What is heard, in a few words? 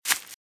Velociraptor - Footstep - Grass - Run - Right